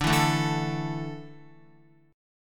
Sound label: D Suspended 2nd D, down, Guitar; strummed